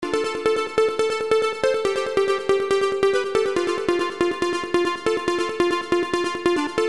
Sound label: sad dots; A trance lead slightly sad in sound.